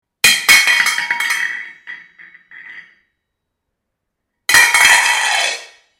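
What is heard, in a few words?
kr kastr upala, pot lid fell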